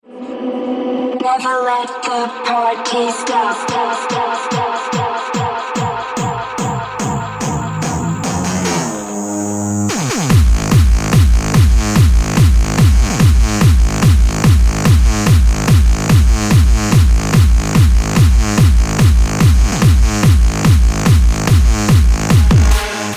Extreme Bass Rock

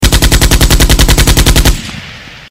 Machine Gun

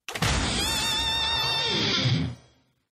Rock; Mobile Legends

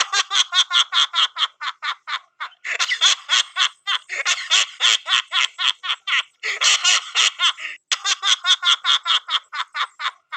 The laughter

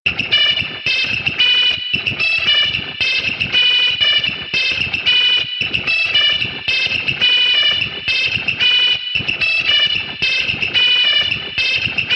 Bird

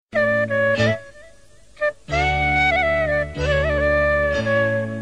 Tamil Melody Msg

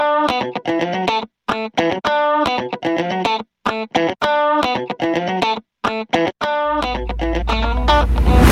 Best Msg Tune

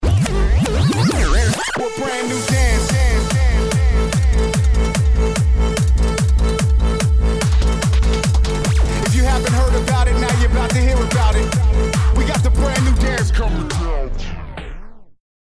Hardstyle

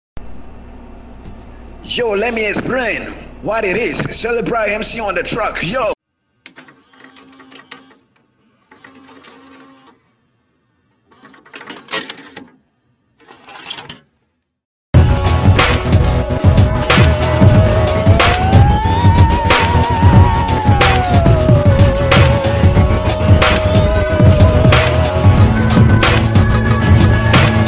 MUSICBOX

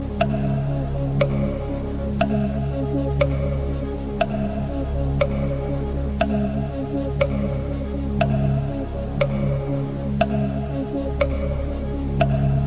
Subtraction Effect

